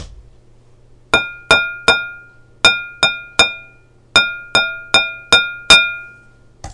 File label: jingle